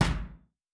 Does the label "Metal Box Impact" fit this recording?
Yes